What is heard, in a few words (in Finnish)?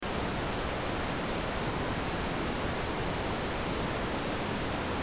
kompandointi